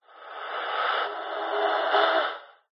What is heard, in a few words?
horror ghost evp eerie breathing fearful processed; zapsplat